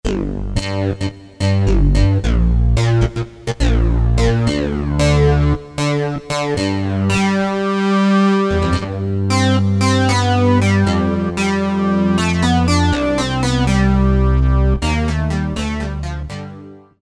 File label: SYNC, Korg DSS-1